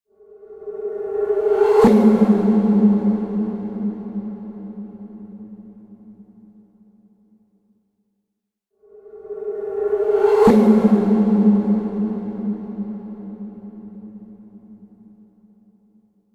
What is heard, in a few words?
Mental Floss